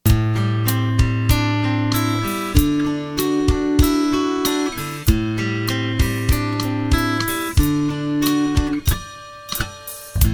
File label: Ballad